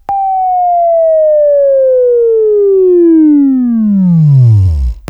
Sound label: Buzz, Left